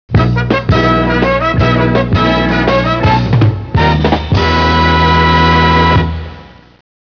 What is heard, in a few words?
Round Win Cue, Game Show Sounds